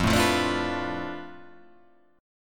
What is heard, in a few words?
F 6th Flat 5th F down, chord